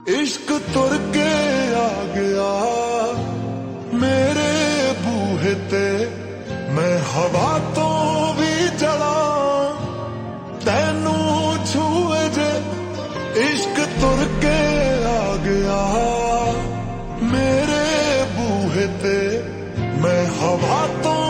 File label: Slow Reverb Version Ringtone